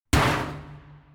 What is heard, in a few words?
demolition